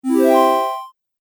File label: 8 Bit Style Harp Up; Video Game Sound Effects